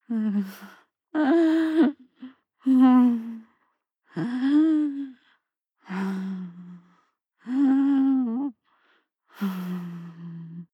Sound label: breath female whimper breath sequence